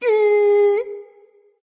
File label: tongue; smite